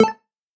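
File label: sounds for notifications on phone in 8-bit style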